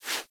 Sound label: Foley Idle Paw Swipe Quick; Enemies; Kubrow; Warframe